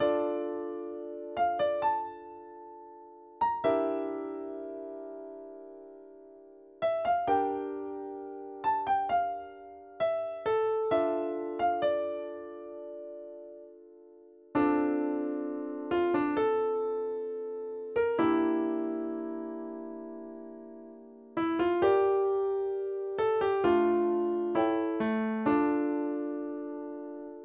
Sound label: instrumental